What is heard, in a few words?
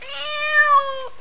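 cat miaowing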